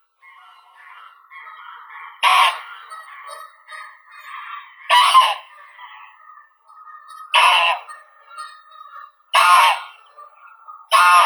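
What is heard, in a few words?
greylag goose, Anser anser; gus serii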